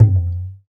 Tablas; Spectrasonics; Supreme Beats; World Dance

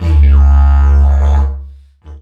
Didgeridoo Kit Samples; Sonic Foundry (Sony Creative Software); The Electronic Music Manuscript Collection; Battery 3 Sample Kits

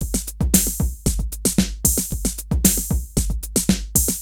drum loops

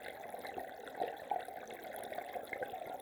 Waterway E Light

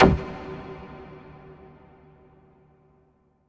STAB Brite Stab; Polyend; Plughugger

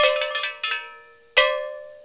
RHYTHM CHI

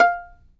fiddle pizz F5 R; Sampleheads; Whole Lotta Country 1 & 2

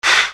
Percussion, EuroDance

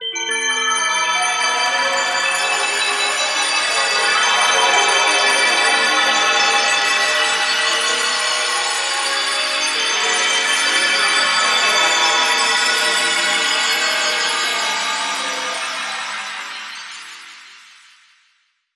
Bell Cascades; Zero-G Ambiosis; Light

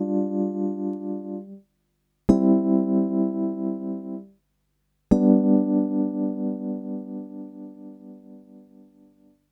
Rhodes

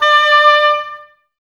OBOES D, Oboes, Wood Winds; Miroslav Vitous; Woodwind Brass Ensemble

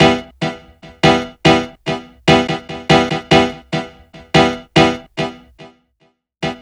Dull Piano; Sonic Foundry (Sony Creative Software); Club Joints and Street Anthems

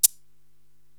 Maraca, Drum Machines

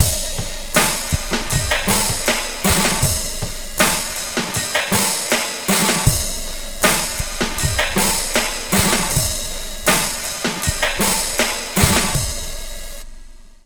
Slo Mo Drumz Dry, Funk, Lounge; Zero-G Kocktail Kollection; Construction Kitz (Lounge)

